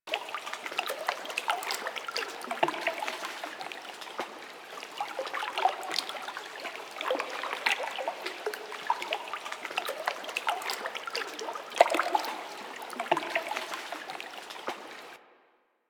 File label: Garden Water Laps; Warframe; Clan Dojo